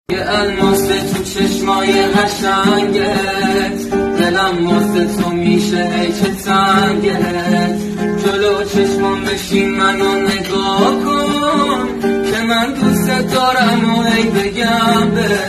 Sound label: Almas (Guitar); ریمیکس